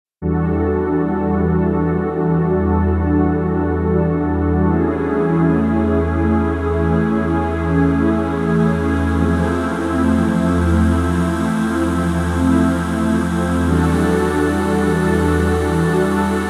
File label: Late Choir Pad